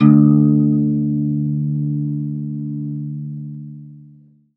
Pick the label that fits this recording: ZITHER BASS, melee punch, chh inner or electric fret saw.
ZITHER BASS